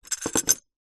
household broken ceramic cup with peices inside down on table; zapsplat